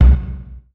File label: DRUMSHOT D+B 160, Drum+Bass, Singleshots; eJay